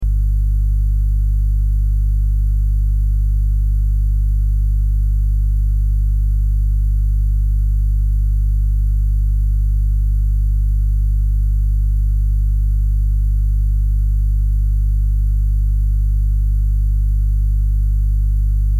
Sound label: Sci Fi Drone, Sci Fi Drone Energy Lfe High Pitch Buzz Loop